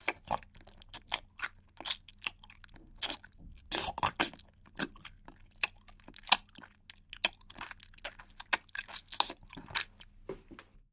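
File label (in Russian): zvuk chavkaniya, sound of chomping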